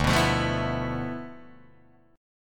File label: D Minor 6th Add 9th, Guitar, DADGAD